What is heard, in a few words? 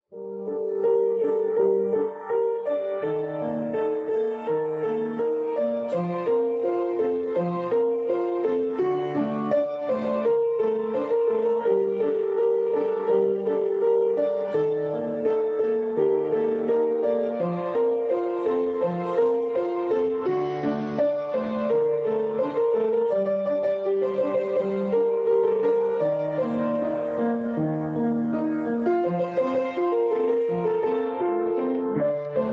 piano